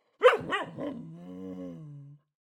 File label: barks, taper, vocalization, inhale, agitated; Eiravaein Works; Epona